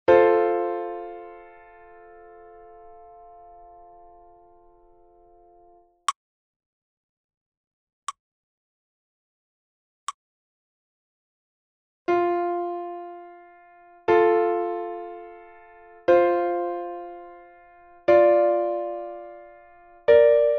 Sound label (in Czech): Dvojhlasa melodie FAC